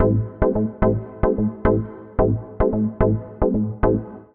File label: Poly Keys (Am7) 110bpm; Music Loops; Poly FabKeys; Ableton; Factory Packs; Singularities